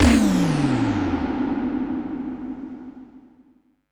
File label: FX, retro house